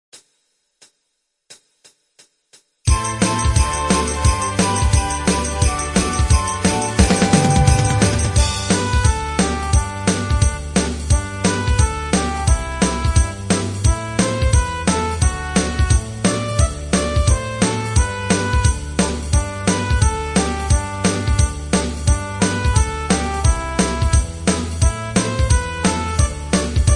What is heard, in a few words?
jingle bells dance; christmas modern